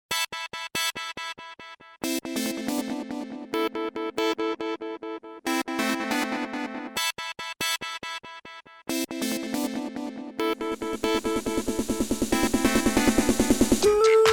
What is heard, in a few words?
Techno; NDS